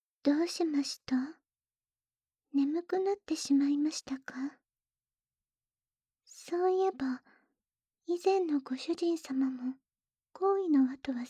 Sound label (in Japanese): 耳舐め 寝かしつけ; 奴隷少女ロザリィ～貧しい絵描きのあなたが捨てられた少女と出会い、一緒に暮らし、やがて結婚するまでの物語～; おやすみなさい; 効果音なし